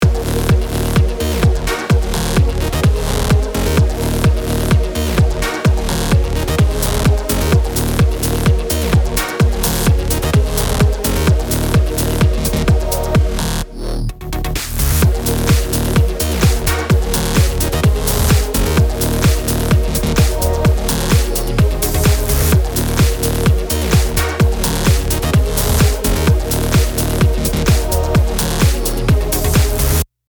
electro

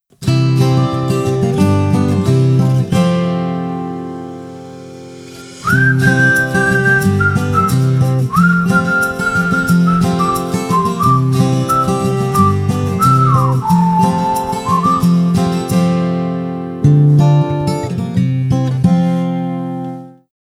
INST Morning View

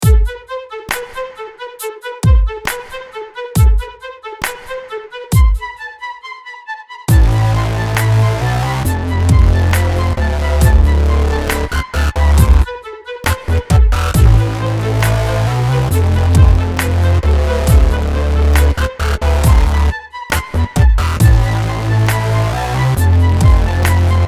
RISE OF THE MACHINES

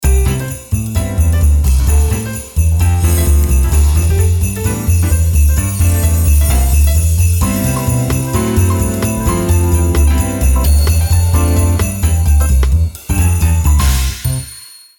Jingle Bells

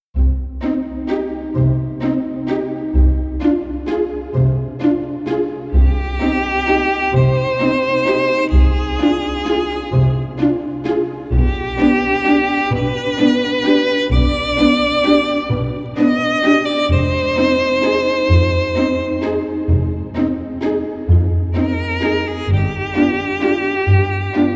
Violine Alone

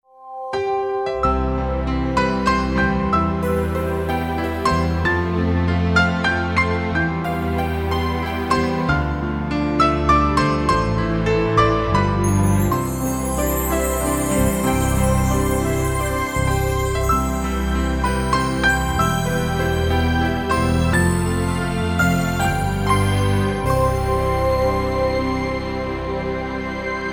Piano Theme

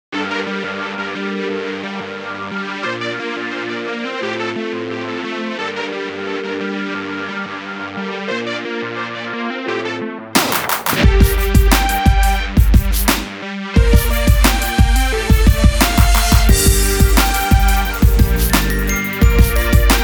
Electro Funk

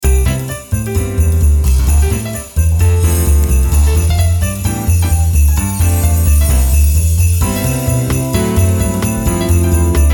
Jingle Bells Fast

